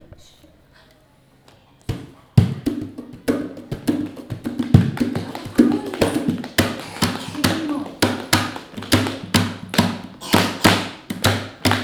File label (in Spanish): tambor